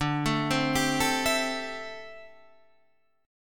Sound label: C 6th D, chord; arpeggiated